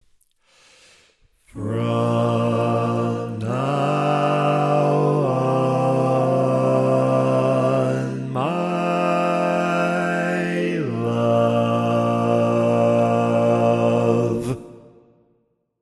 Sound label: Bass, barbershop tag